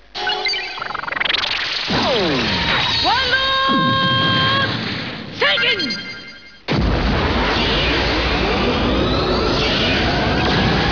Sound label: World Shaking